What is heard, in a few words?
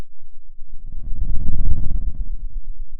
Sound of a dinosaur